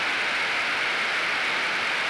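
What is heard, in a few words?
screen static loop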